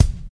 natural kick sample g key, Royality free bass drum tuned to the G note. Loudest frequency: 1060Hz